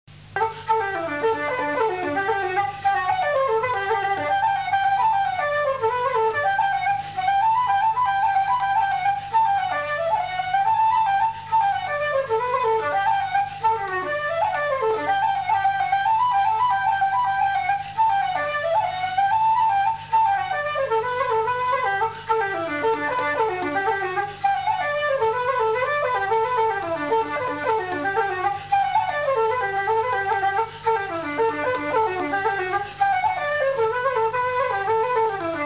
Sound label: flute